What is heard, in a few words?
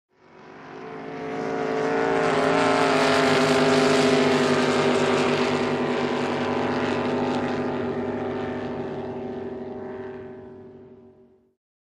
Drag Boat By Cu Fast